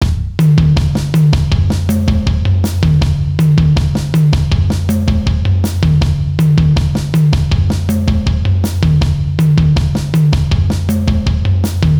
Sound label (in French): Samba avancé